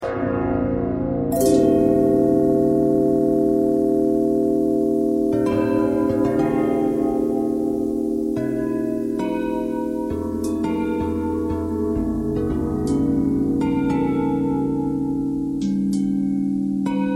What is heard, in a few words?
bell bell thread noise, bells